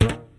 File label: wire; hl2